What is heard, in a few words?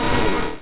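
wood crack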